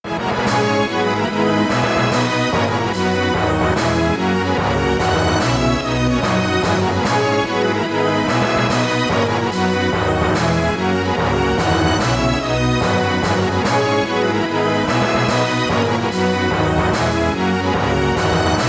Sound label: All Clear; Dungeons Dragons Chronicles of Mystara; reborn tracks